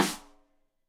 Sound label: Rim Shot Stereo; Deeply Sampled Drums; SMDrum